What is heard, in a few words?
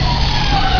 Zone List Close; Net Power 2000 #2